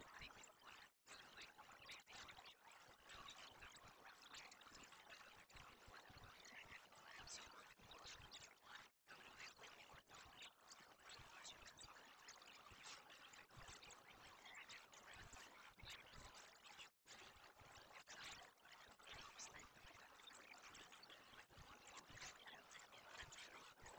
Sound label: poisoned audio 45db; audio poisoning